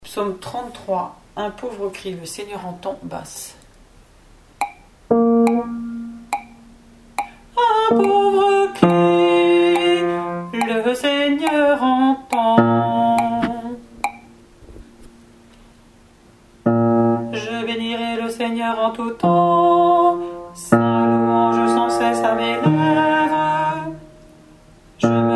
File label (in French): Psaume BASSE; 33 Un pauvre crie le Seigneur entend